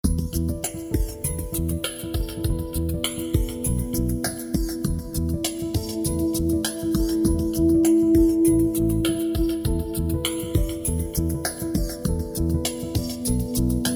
Quiet Intensity

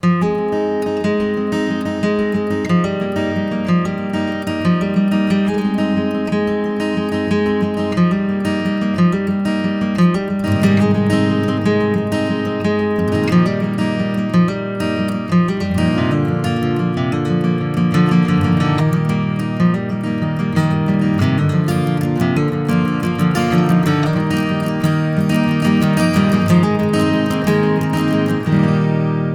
Happy Outcome ALT (no pads)

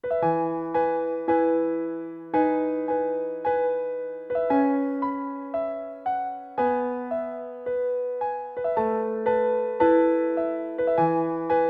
Jingle Bells; the first noel

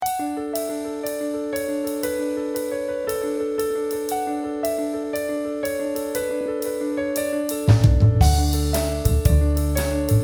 Playful Piano

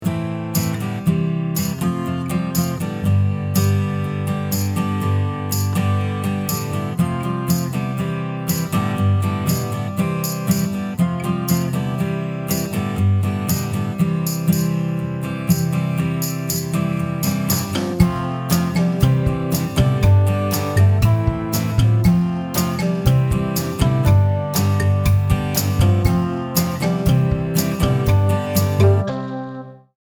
Flowing Along

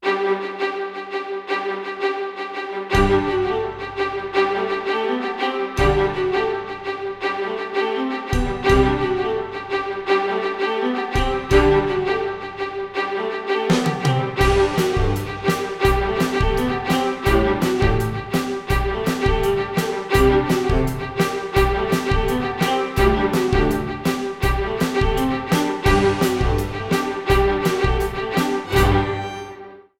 Strings In Motion

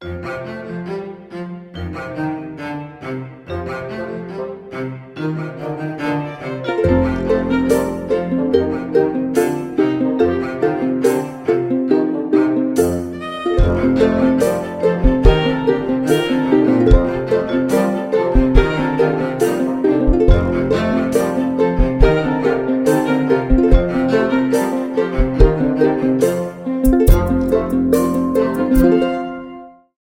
Quirky Motion; quirky nature

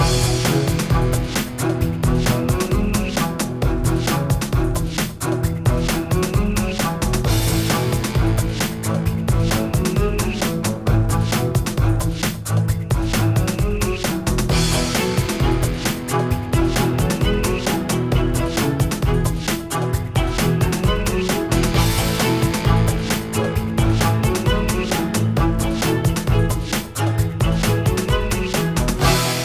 String Up Da Boogie Blues

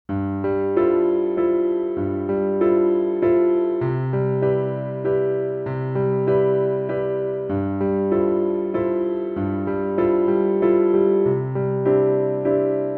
solo piano

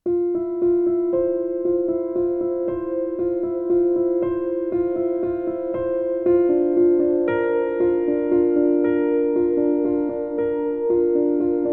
no cello

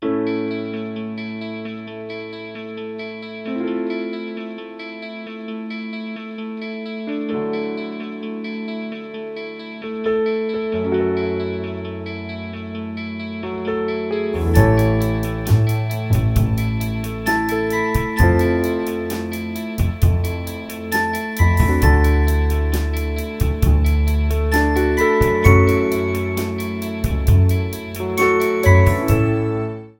Guitar

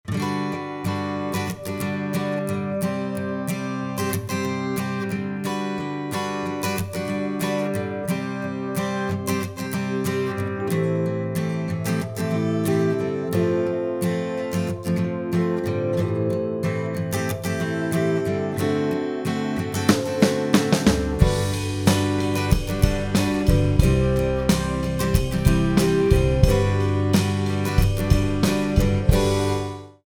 no melody

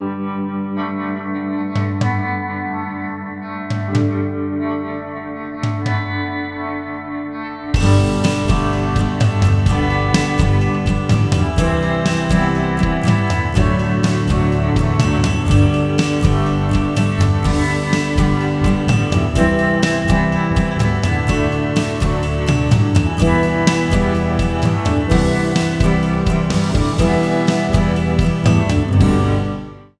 no cello

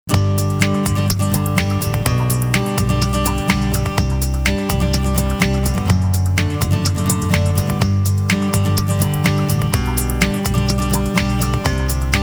Guitar Groove

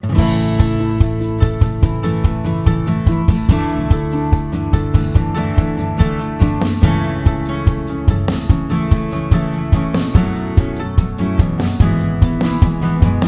Acoustic Power

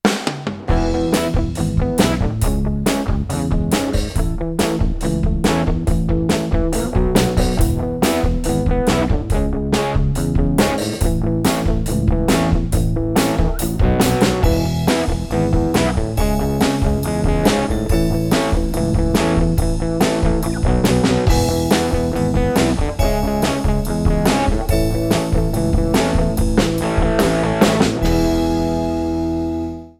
Happy Street Dance

